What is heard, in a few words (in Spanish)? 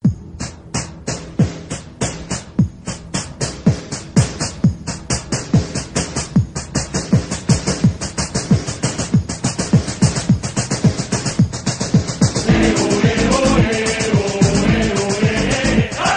HIMNO; CRUZ AZUL